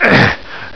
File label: pain; alienarena; doomunls